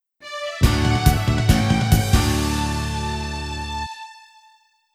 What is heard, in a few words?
Excitement Message; Wii Room (Shopping Update) Soundtrack